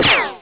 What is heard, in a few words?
zzam; Insaniquarium; popcap